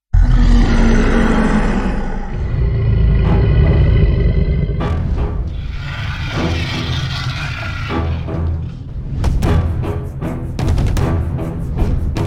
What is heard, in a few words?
song backing track